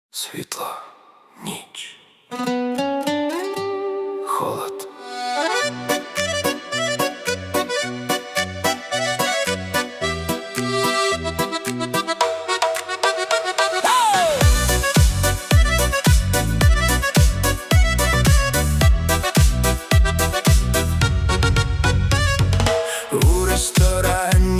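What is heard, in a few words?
Ukrainian music for the car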